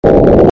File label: Boost